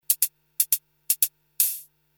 hihat

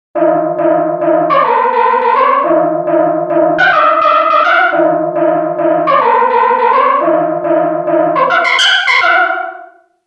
Psychiatric lead

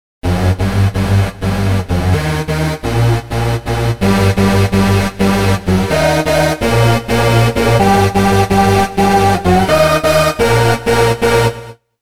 Raver

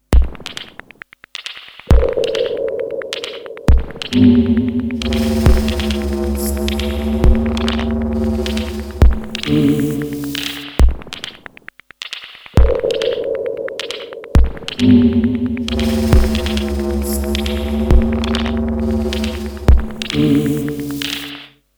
Not really granular

